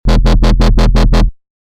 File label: stereo bass